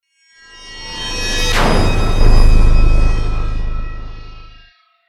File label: Stinger Swell 5 Seconds